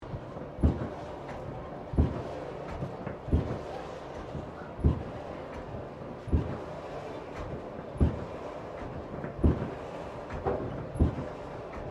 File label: Bellows Blacksmith Operating Loop; Colonial era objects; Machinery